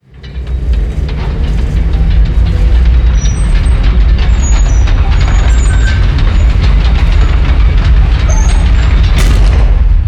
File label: Pillar Dry; moul-assets